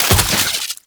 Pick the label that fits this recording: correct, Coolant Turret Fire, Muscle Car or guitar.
Coolant Turret Fire